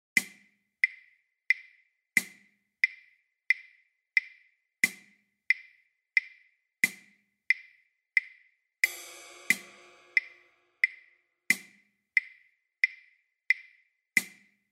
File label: Descending by Step Pattern A Accompaniment